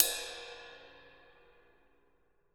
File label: RIDE, Drumscores, KITS MEDIUM; 90s sample CD